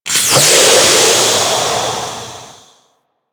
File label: Deployable Cover Deflate; Grineer